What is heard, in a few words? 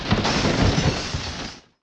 glas brk; dracula